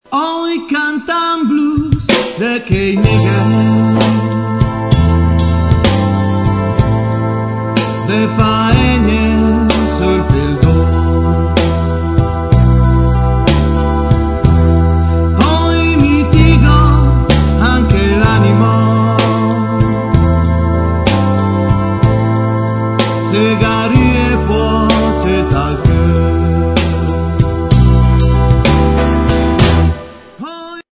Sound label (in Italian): blues negher; jazz